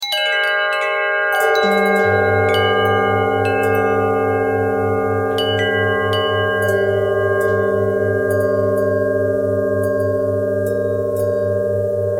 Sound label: bell harmonic twist